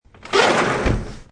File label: Slide Door Close, Sliding door closes.; Doors